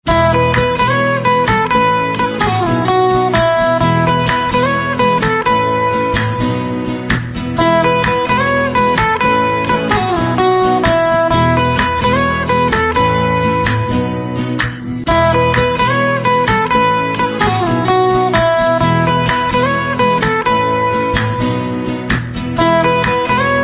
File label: Guitar Music Ringtone